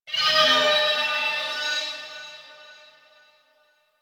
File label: Crp Ship Energy Flow Texture Med; Ambience; Warframe; Corpus Ship Remaster